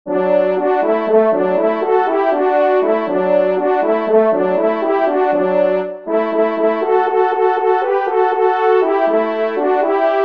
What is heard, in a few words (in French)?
Louve Duo